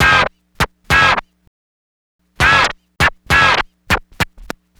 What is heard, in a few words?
Scratch, Trance; Sonic Foundry (Sony Creative Software); Trance NRG VOL-1